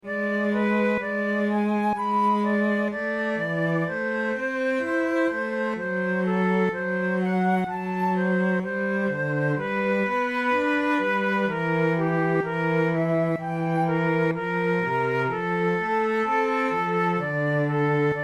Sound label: recorder sonata in C major largo; marcello op2 no9 iii